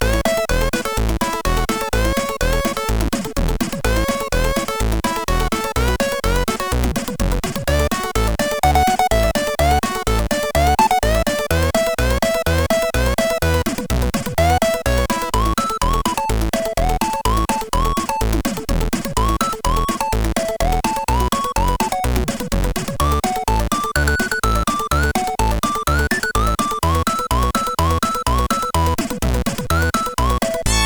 Country, CSG8580R5

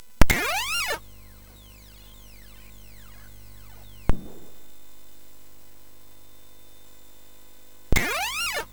Sound Fx, MOS6581; MOS6581R4; hvsc

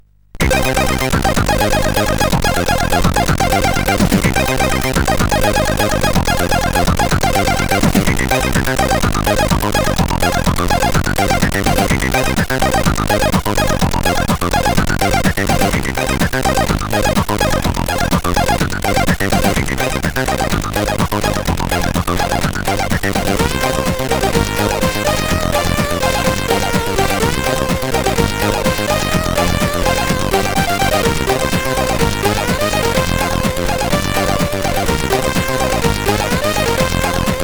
Fast Moving, sid CSG8580R5

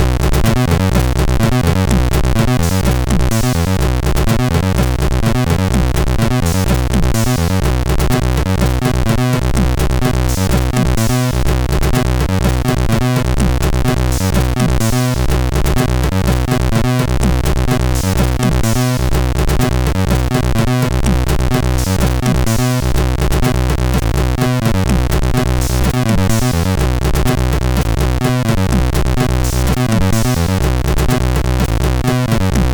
Sumdrums, MOS6581R4; sid

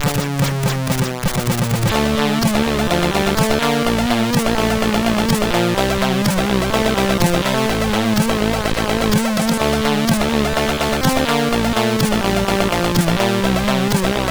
POP Intro, sid CSG8580R5

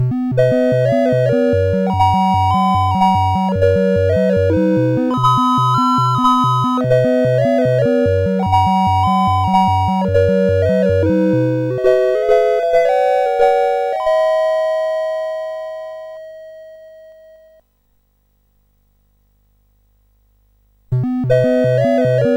BASIC sid CSG8580R5, GAMES; Stack 64 Simon

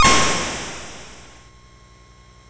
BASIC sid MOS6581R4

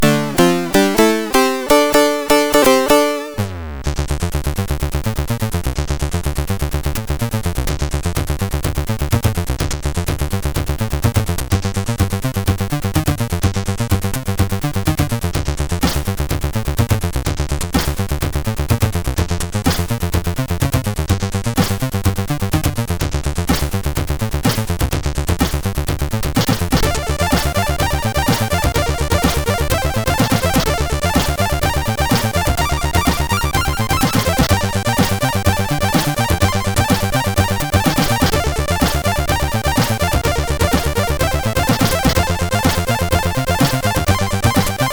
Jam, MOS6581R3; Jam 2

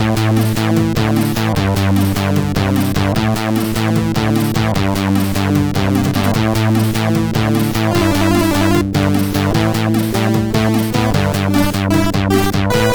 Note, CSG8580R5

